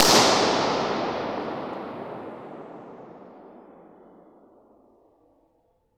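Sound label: Impulse Response, Domkyrkan, Sweden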